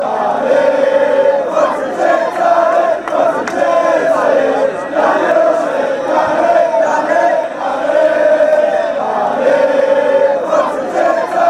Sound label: come on, chants; vicenza